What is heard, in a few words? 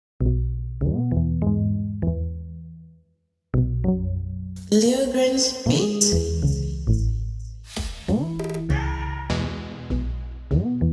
Beat Instrumental